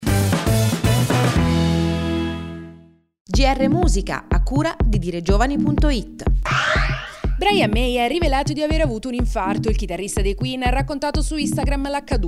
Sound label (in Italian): musica